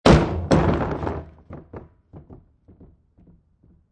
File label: Garbage Can Large Plastic Crash, Impact, Garbage Can, Plastic, Large, Crash, Thrown Down On Cement